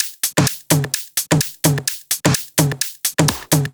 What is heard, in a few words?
Loop, 128 BPM